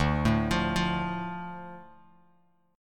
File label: D Minor D Bass Standard; Dm arpeggiated; 10,12,15,10; JGuitar